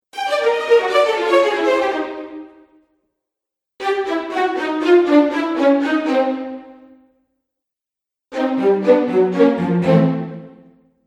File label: Fail Strings Collection, cartoon music